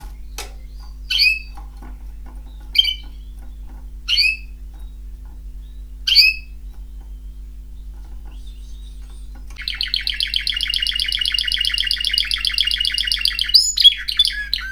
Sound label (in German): Hahn